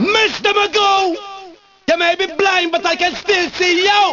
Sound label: formant; vocoder